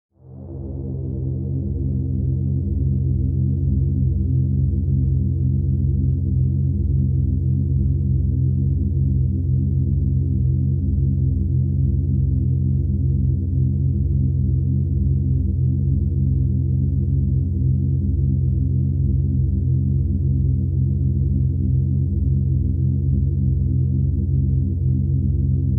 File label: Drone: Eerie, Menacing, And Atmospheric Monotone; Drones; Sound Design; Stuart Duffield